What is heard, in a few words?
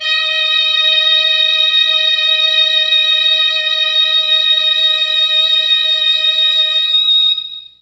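D#4 Strings Brass, Mellotron; Mike Pinder - Mellotron Archive CD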